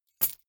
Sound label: key ring large drop carpet soft; objekte; schluessel